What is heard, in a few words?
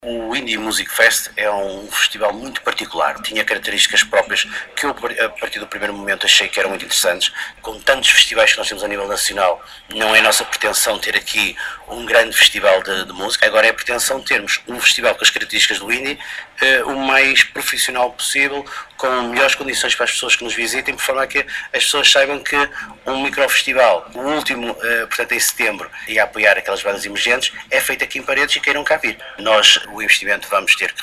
Indie